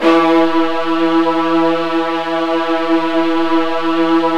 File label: Virtuoso Strings